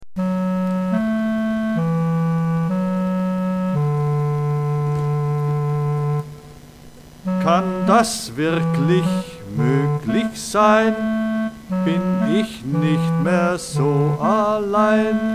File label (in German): Gesang